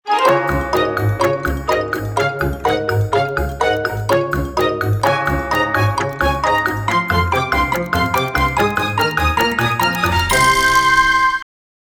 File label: pswitch fast; kirby p switch